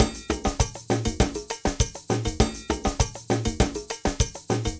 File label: Ensemble, Latin Percussion